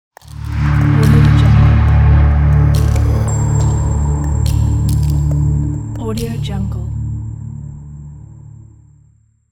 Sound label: motion logo; danielmix